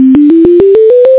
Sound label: scale